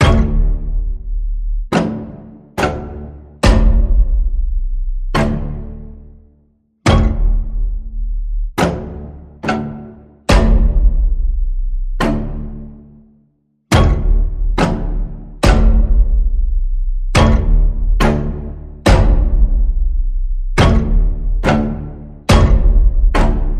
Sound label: String Pulse D, Key of D